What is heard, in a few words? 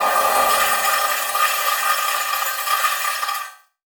Transition Metallic Drone; Production Element; Title Transition; AdobeCC